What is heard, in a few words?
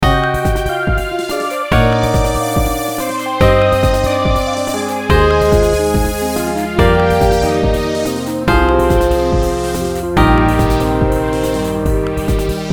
beat